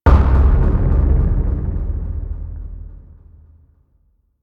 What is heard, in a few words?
UI Stinger Hit; starcraft 2 wings of liberty